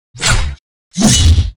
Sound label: Mon beast woo leader attack